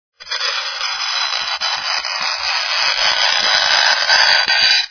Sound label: chalkboard screech